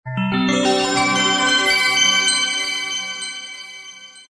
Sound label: logo sparkle